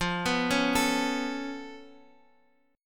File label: Db Augmented 7th F